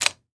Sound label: Weapon Gun Pistol 9mm Glock Clip Insertion Quick; AdobeCC